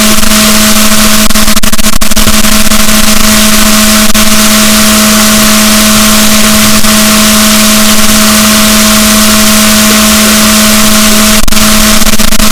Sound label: rorquals negatif, gaussian noise, BalaenopteraPhysalus Chile